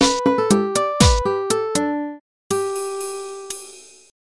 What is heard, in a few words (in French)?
carillon